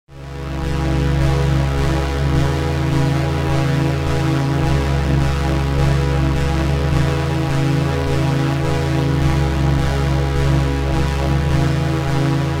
sound lab melodic cycle (chorus); analog synth

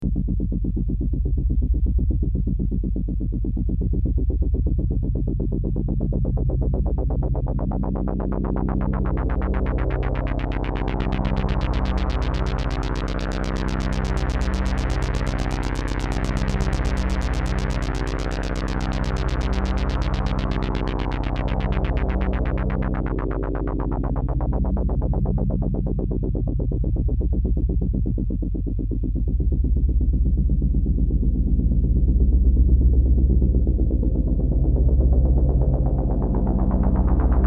half res adsr lfo; lp vcf; analog synth

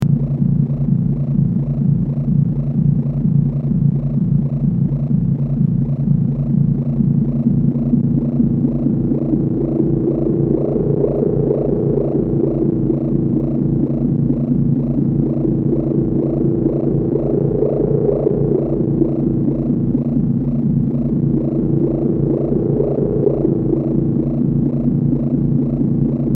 Machinery; analog synth

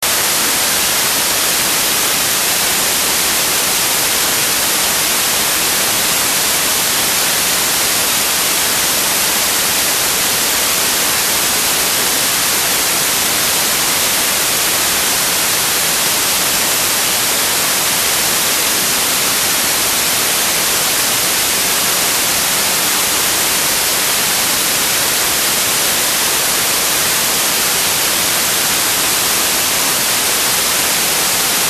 white noise; NOISECORN

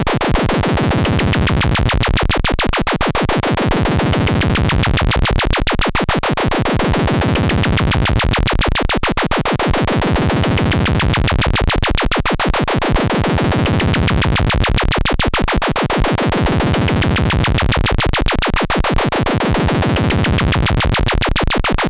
mod sources beating, analogsynth, NOISETOASTER

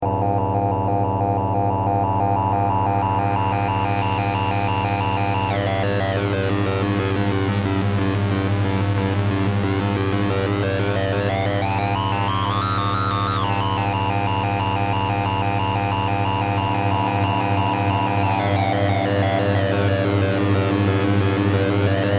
Sync Weirdness, analog synth

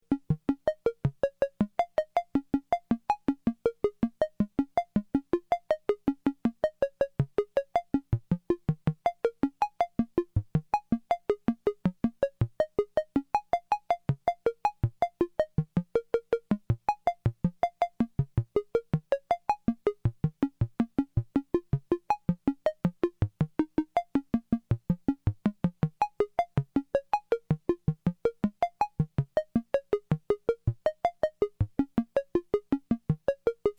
dry random, analogsynth, SEQ16

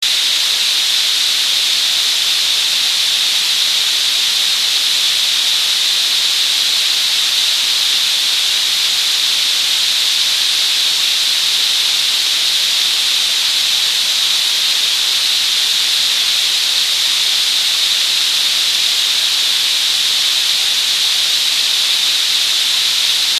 high pass noise; NOISECORN

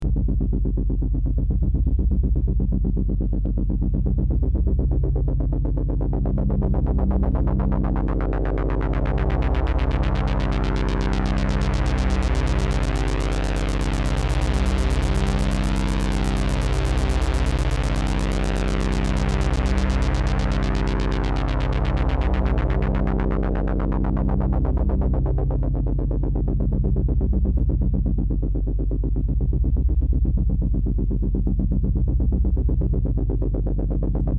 no res adsr lfo LP; state var vcf; analog synth

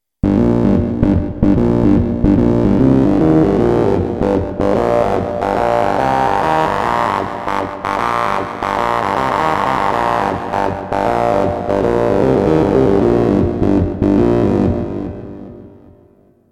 Bass line with drive and variable VCF; synth; analog synth; Penetrovisk

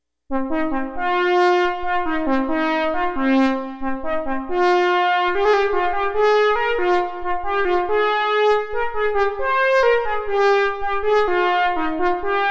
Horns solo, synth; analog synth; Penetrovisk

